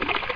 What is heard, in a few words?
splash; ProOne: Netcracker Netscape Navigator